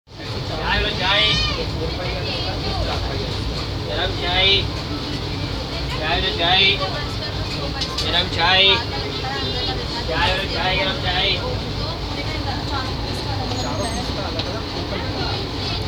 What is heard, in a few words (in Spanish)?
TREN CERCA AGRA; Chai tren